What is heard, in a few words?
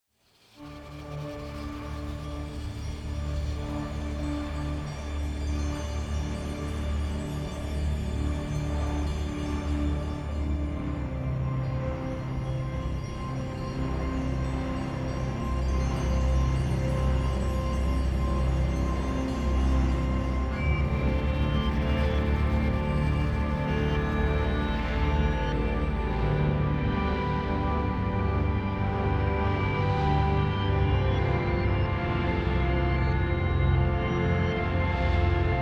the final ascent dronar metal